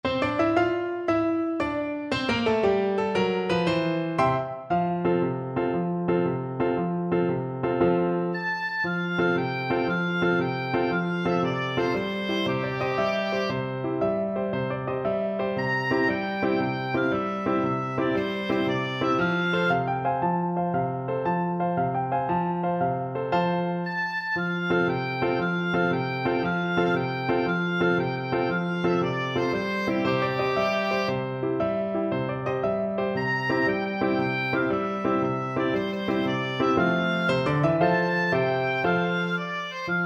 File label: luna mezzo mare, oboe